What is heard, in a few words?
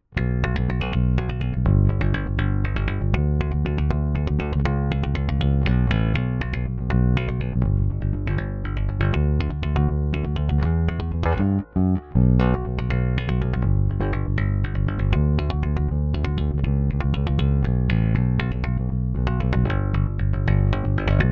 Preci Finger Metal